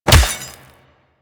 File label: Melee Fist Impact Robotic Flesh; Warframe; Weapons; Fists